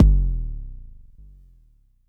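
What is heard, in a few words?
Long bass drum, Jomox Airbase99, Drum Machines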